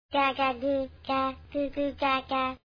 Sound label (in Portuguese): bebe fala